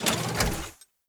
Mech Step Arm Down; Nechro Mech; Vehicles

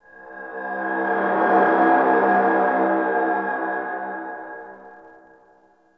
Synth; Warframe; Clan Dojo; Dojo Randoms

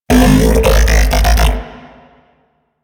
Mech Ambient Vocal; Warframe; Vehicles; NechroMech

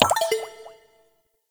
Messenger Window Close; Ambience; Warframe; Nineteen Ninety Nine

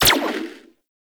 Shot Fire, gun; Warframe; Tenno; Tommy Gun; Stradavar Prime

